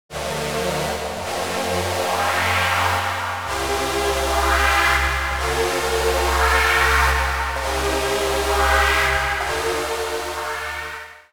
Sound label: noisy choir hall